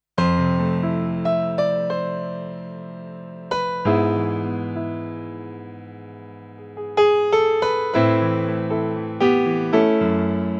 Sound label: Yamaha P 125 Piano